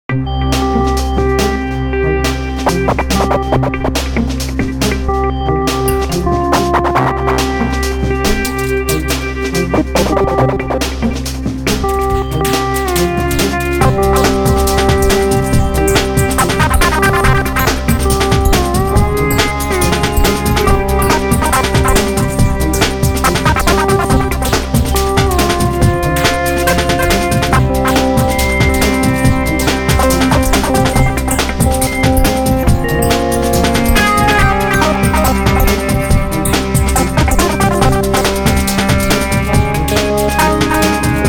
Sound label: Multiball, Pinball, Music; Pure Pinball